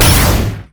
crit attack; lucian skin01

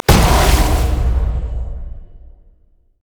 launch; LoL; syndra

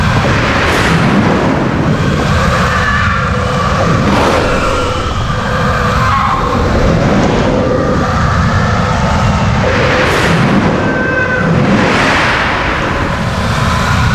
defile loop; lich; karthus

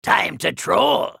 attack; Trundle

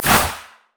shadowswing; zed shadow

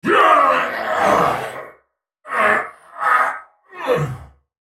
dying; Viktor; Character Voices - English; en US

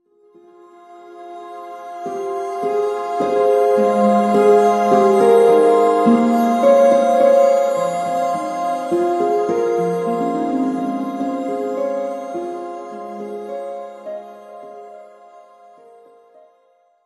hymn of valor melody; sona

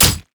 basic attacks; quinn

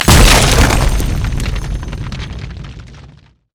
pain; LoL; trundle

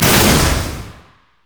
fengshuiengine; riven

